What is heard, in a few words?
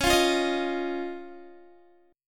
Db Major Add 9th, Guitar; Dbadd9; strummed; tuning=4F,4A,5C,5G,5C,6E; 20,20,17,20,17,x